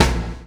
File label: CHAIN; Spectrasonics - Backbeat